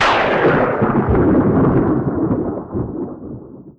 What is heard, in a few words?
honka; cumulclouds